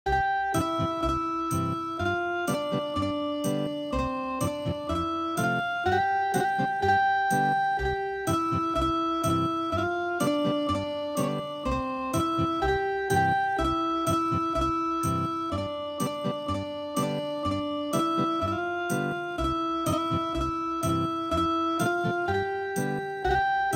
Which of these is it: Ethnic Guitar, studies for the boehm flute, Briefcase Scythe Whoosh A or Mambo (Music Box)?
Ethnic Guitar